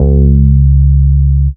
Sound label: Ultimate Bass Root C#; Vengeance Samples